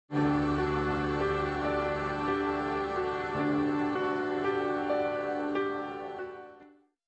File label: Emirates Sound Logo